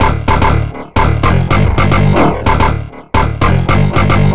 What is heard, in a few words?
Bassline; Drums & Drones